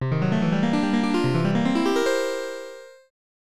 Tada; Heisei Shin Onigashima; snes

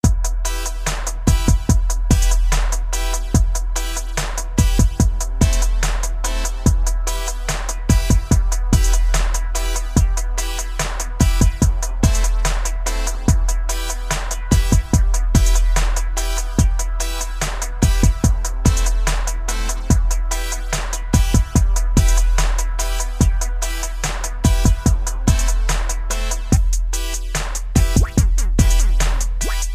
reggae jam; Cally's Caves 3; android game music

